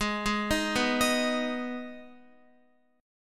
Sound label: G sharp Minor G sharp down Guitar, chord; G#m arpeggiated; JGuitar